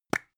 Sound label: plastic spray can small open short